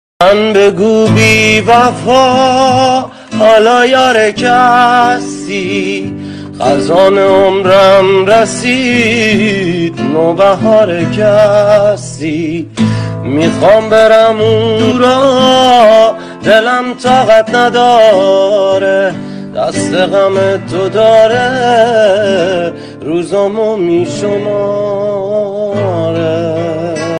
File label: gitar